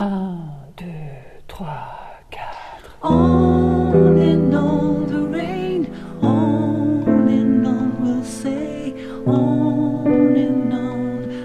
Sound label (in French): Mezzo chante sur Piano, 3vx